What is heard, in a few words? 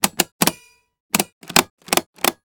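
Suitcase being unzipped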